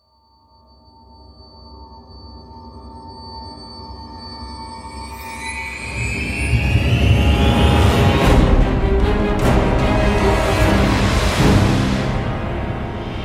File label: scary noise